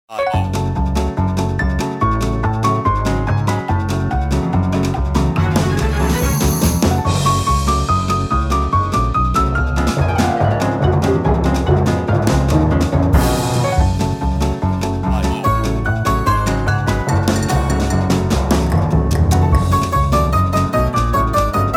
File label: Comical Madness